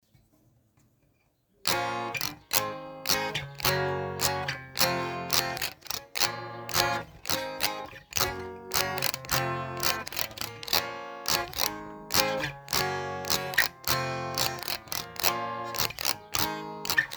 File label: Bbm Abm Gb F